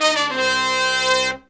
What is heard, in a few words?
Pop & Funk Brass, 096